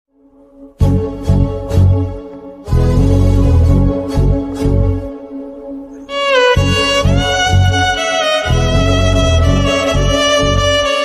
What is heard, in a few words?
tamil movies sad bgm sad songs